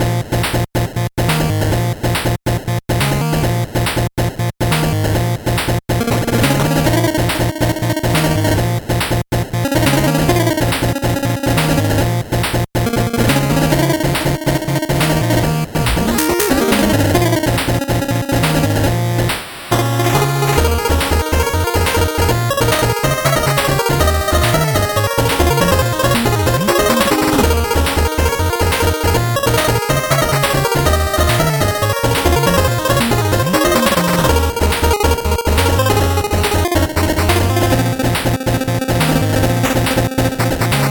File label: 8bit bgm fast